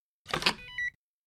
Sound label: Swipe Key Card Alarm; hi-tech-various